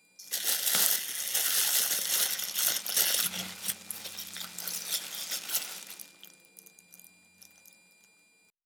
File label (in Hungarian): zajosság; 5 hang zajossag